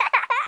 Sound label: giggle; garrysmod; lara